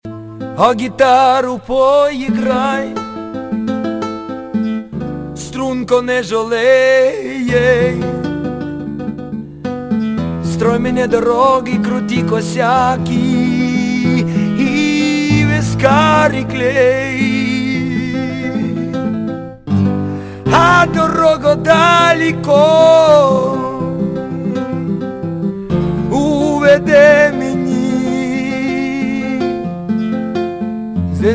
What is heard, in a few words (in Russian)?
gitara cyganskaja narodnaja